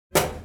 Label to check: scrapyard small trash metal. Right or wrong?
Right